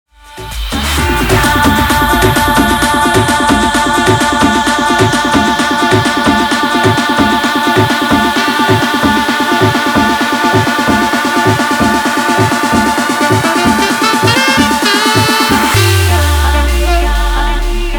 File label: alegria guaracha remix 130 bpm